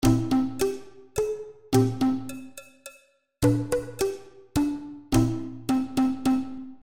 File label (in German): Boomwhackers klaenge